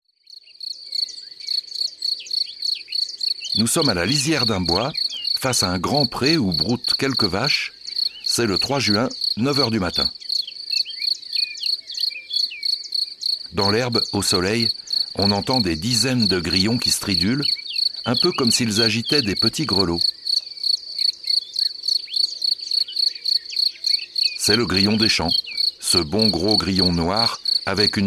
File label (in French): Le grillon; ABC de la natue